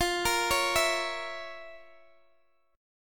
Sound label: F Major 7th Suspended 4th Sharp 5th F, Guitar DADGAD